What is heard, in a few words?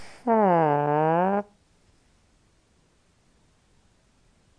parp parp